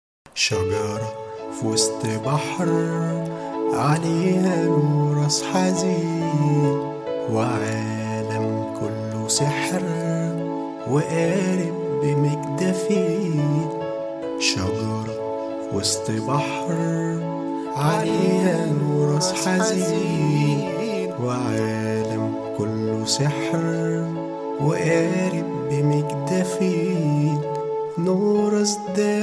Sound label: new melody